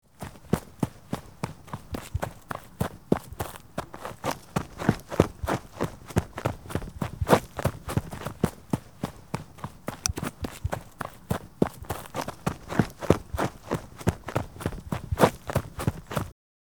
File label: Footsteps running on dirt jogging steady